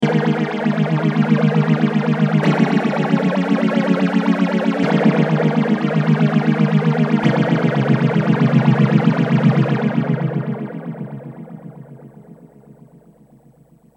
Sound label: Mutron Phasor II